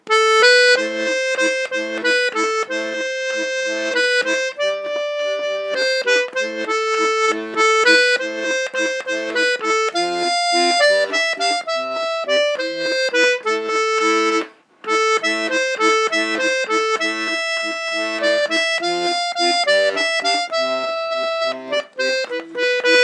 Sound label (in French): valse